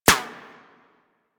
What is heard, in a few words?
Sentient Projectile Hit; Warframe; Sentients; Symbilyst; Weapons